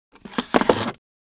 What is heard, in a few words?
Drag a steel chair